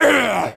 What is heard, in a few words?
Orc Small Die